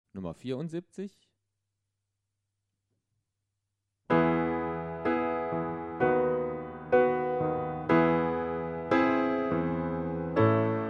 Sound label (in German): Akkordfolgen